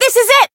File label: Voice attack; isuka